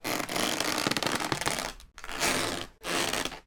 household chair wicker movement lean back lean side to side squeak creak